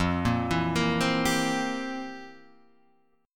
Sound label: F Major 7th Suspended 2nd Suspended 4th Guitar Half Step Down